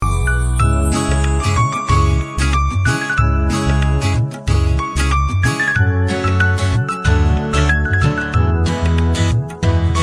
sitar n piano ringtone

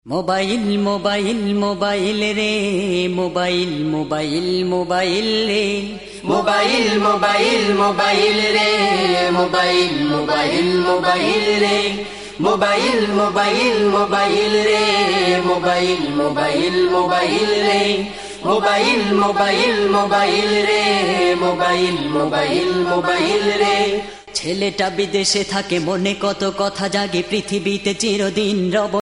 bangladeshi islamic ringtone

